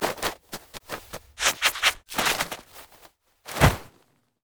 emote dance; LoL; talon

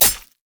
crescent slash; LoL; akali

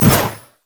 infuse; LoL; soraka

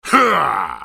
effort; Darius; Character Voices - English

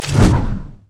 basic attack; LoL; jarvaniv

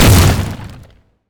landslide; LoL; glacial malphite

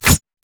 crit attack; LoL; sion

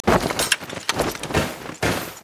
emote dance; LoL; twitch

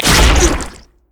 passive chunk crush; LoL; zac base

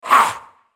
spell cast effort; Thresh; en US

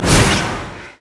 basic attack; lucian skin01

